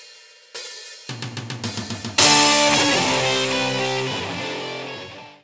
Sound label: bad music; SnootGame